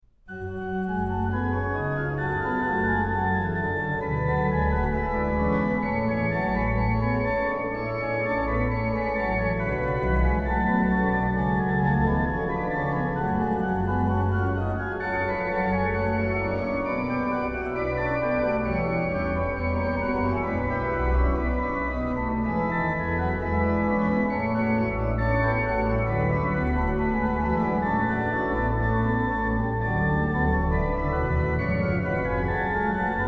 Ach wie nichtig, ach wie fluchtig, organ; BWV0644; BACH; BACH ORGAN WORKS; CHORALE PRELUDES

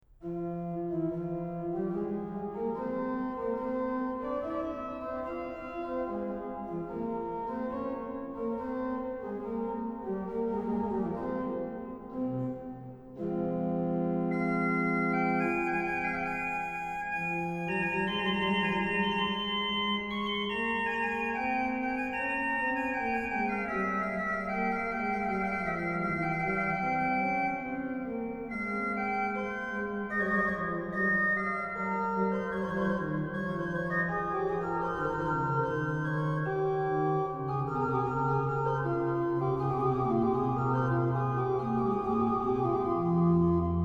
Concerto in G Adagio, ORGAN; ORGAN CONCERTI; BWV0592

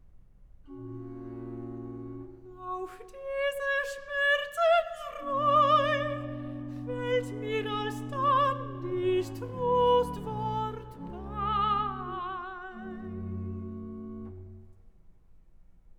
Recitative; Auf diese Schmerzensreu; Bach; Cantatas; BWV199; Mein Herz Schwimmt in Blut

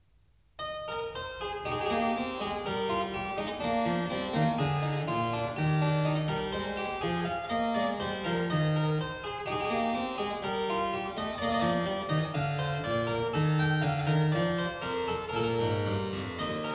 E flat major, Gavotte; French Suite No.4; BWV 815; BACH; CLAVIER WORKS; FRENCH SUITES

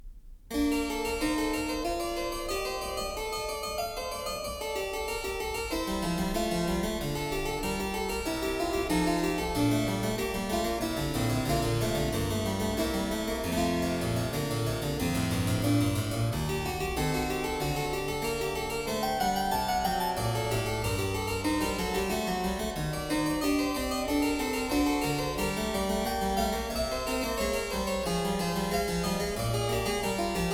Prelude, Well-Tempered Clavier, klavier; BACH; WTC 1; Prelude 23; BWV 868

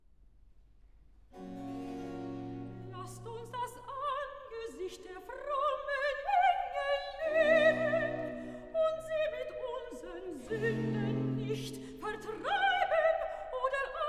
recitativo, soprano; vocal; BWV019; Last uns das Angesicht; BACH

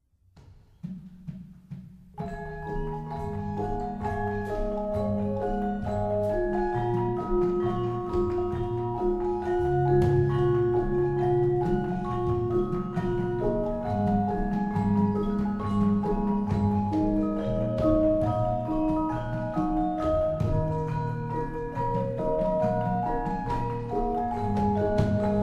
Javanese Gamelan; Sampak Nem; Indonesian

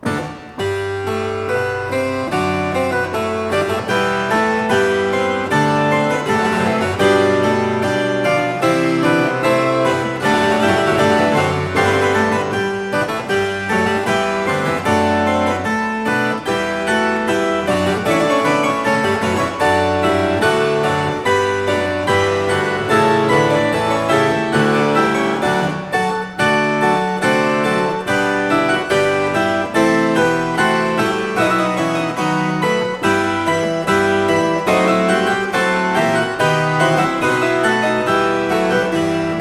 Cembalo; Variatio 30 Quodlibet a 1 Clav; Bach; Goldberg Variations

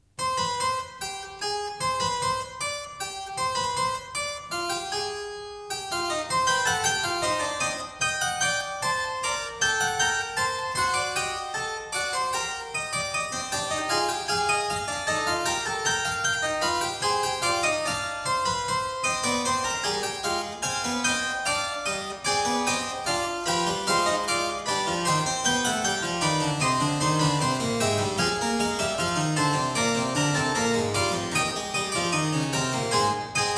Fugue in C minor, clavier; Prelude & Fugue No.02; BWV 847; BACH; WELL TEMPERED CLAVIER; BOOK I

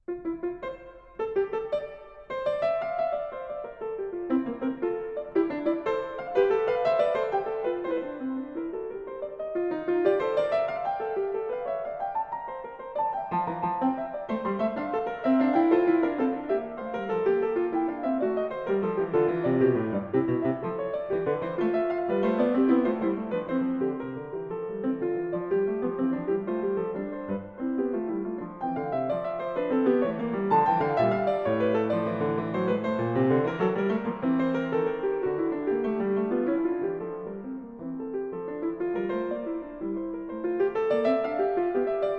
Fugue, F major; BWV880; Bach; Well-Tempered Clavier; Book 2

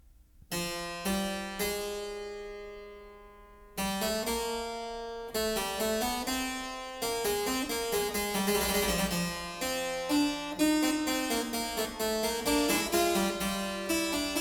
fugue; BACH; WTC 1; Fugue 14; BWV 859

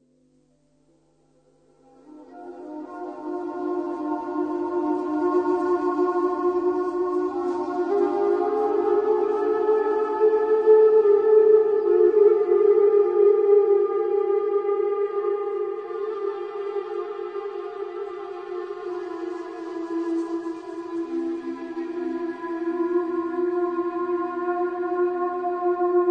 Flute Interlude; ambient

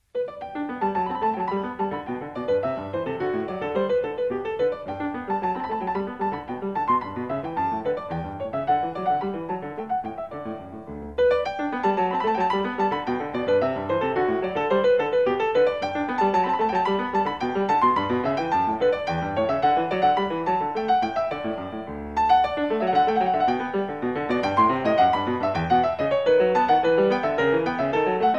bourree; French Suite 6; BACH; FRENCH SUITES

